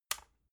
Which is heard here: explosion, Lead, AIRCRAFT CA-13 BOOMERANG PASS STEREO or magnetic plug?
magnetic plug